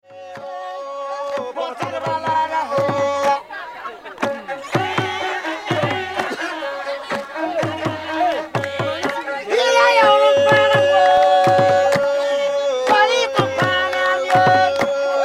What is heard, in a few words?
Bendere Mossi, Burkina Faso; Bendere, Mossi dance; ethnomusicological recordings; world music; AFRICA 1987 - 91; Ph. Ciompi